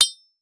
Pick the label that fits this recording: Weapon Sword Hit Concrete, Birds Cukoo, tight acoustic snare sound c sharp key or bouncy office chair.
Weapon Sword Hit Concrete